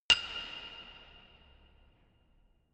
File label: notification glockenspiel creepy single high